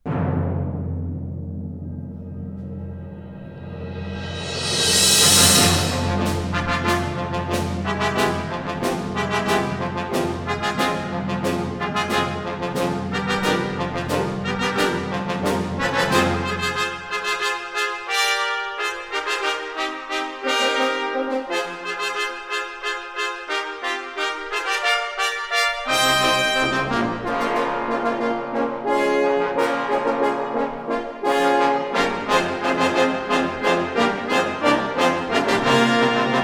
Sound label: Fanfare for the 99th Fighter Squadron